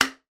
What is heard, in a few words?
household bleach cleaner spray bottle trigger release